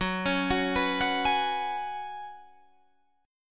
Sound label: Gb Suspended 2nd Suspended 4th, Guitar, Drop D; down; chord shape